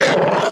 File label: stomper warcry, enemies; Factorio